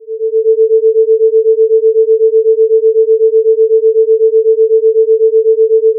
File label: sine 440 448 mono sum -6dB